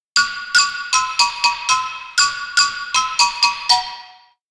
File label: Xylophone